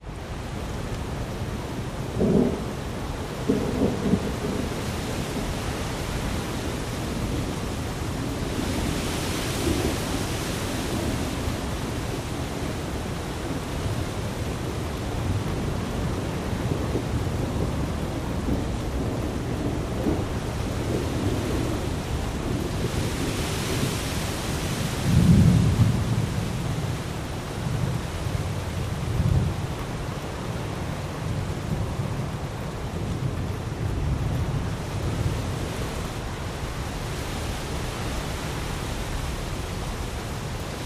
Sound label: thunderstorm, rainfall